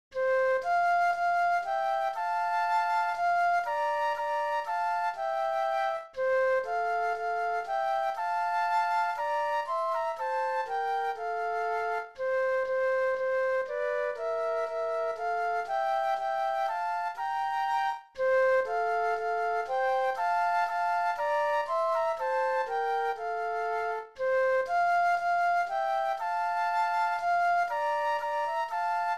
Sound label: Flute Duet